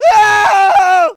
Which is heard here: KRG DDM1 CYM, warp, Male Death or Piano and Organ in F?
Male Death